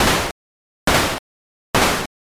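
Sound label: footsteps water walk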